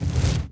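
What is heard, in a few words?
push boulder large; objects; Star Wars Obi-Wan; XBOX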